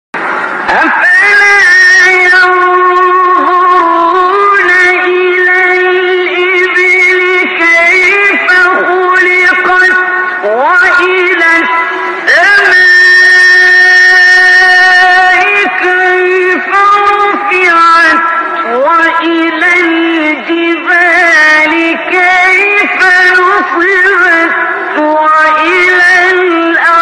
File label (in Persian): فرازی ملکوتی از سوره غاشیه; استاد عبدالباسط